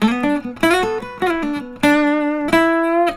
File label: licks, Blues Guitar; ILIO; Fretworks